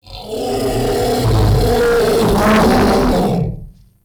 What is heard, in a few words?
Ancient Tank Death, Enemies, Infested, Barks; Warframe